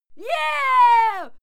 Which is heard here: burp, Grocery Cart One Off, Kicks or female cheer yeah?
female cheer yeah